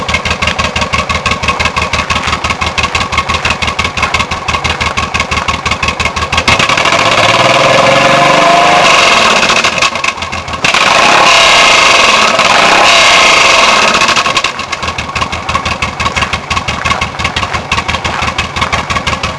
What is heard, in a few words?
VTX1800C with baffle 84-109db, exhaust